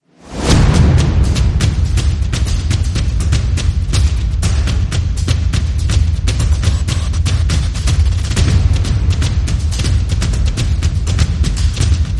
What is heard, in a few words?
Epic percussion; Bootflare